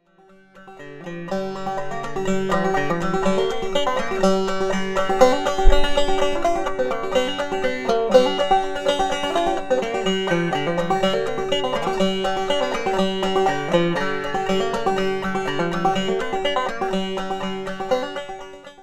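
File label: banjo, African blackwood; Recordings: M-Audio microphone and Fostex MR16 recording studio; Pretty Polly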